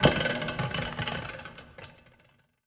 ambient battle; World Of Warships

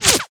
bullet whizby; Call of Duty Modern Warfare; whizbys

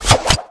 kiting arm reaction

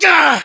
melee attack

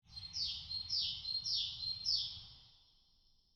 europe birds; War Thunder

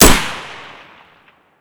ak74 raw distant; Escape from Tarkov

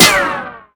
rico metal, ricochet; Modern Warfare

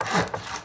hammer saw; Rust

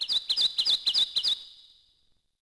Forest Bird; Civ4 warlords

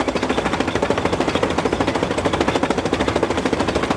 treads tractor; bt7; World of Tanks Blitz

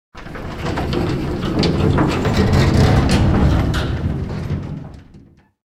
air stall rattle, engines; War Thunder

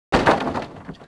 Oars Wood; Civ4 warlords

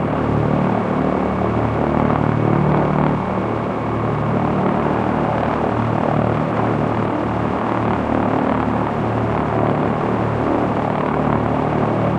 plane; World of Tanks

